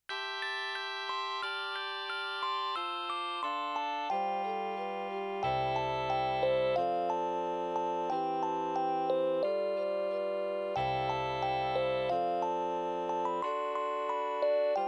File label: lead in midi